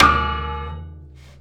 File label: Void Perc; The Void; Processed Drumkits